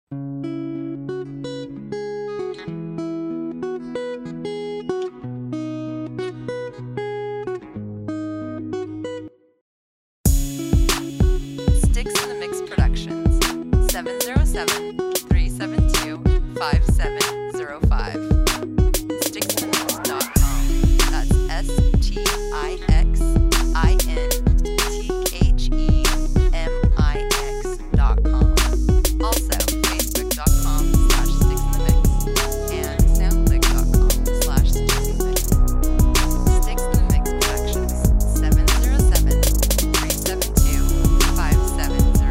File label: ACOUSTIX